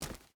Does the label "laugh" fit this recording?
No